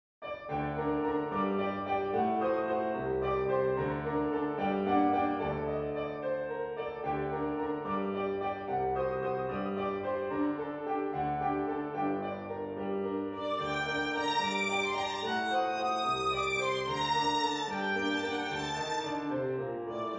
trio mouvement iii scherzo; adam morgan

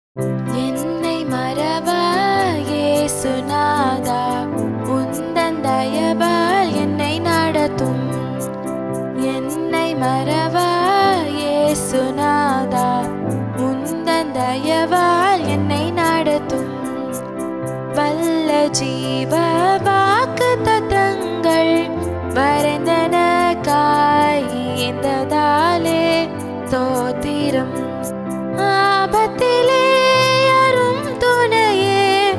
voice; dear lord jesus ennei marevaa; dunamis

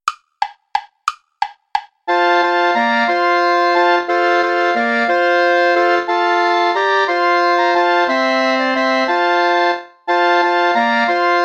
play along without clarinet

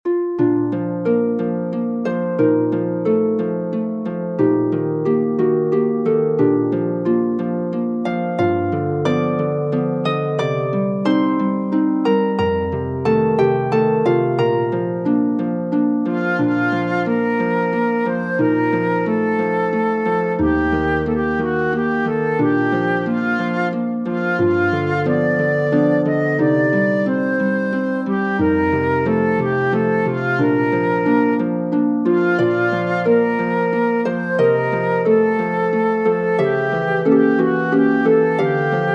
for flute piano

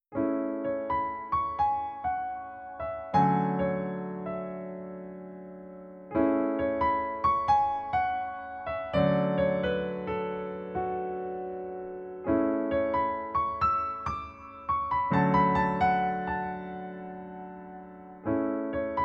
7th chords

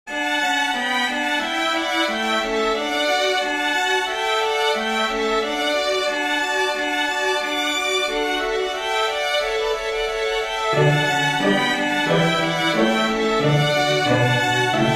for string ensemble; bach johann sebastian; ritornello den soll mein lorbeer scha tzend decken

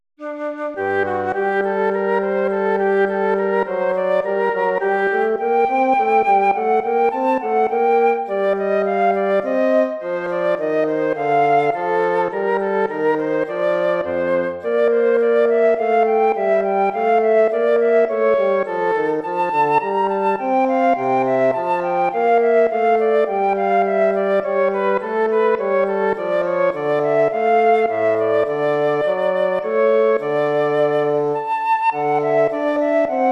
courante

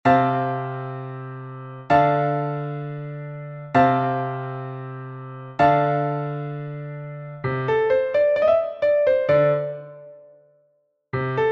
techno

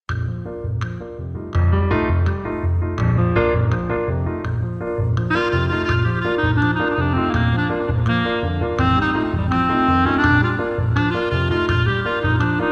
jamaican rumba

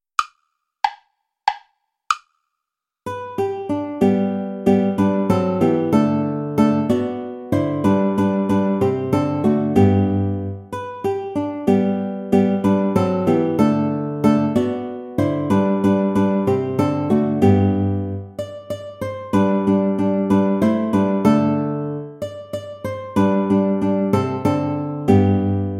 play along without guitar; asikhatali; traditional

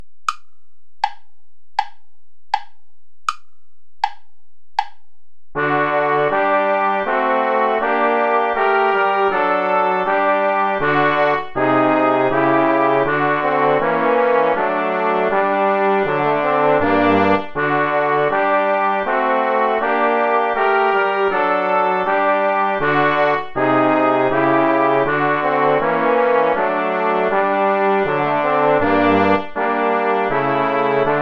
play along without trumpet; bach johann sebastian; ach gott vom himmel sieh darein

